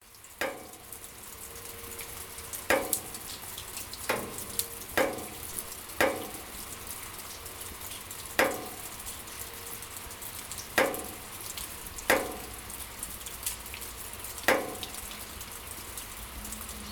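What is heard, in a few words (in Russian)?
звук капель дождя падающих на металлическую пластину в начале душа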